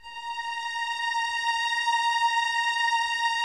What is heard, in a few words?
A#5, 11 Violins, Soft; Miroslav Vitous